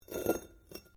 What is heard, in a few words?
METAL ON STONE SCRAPE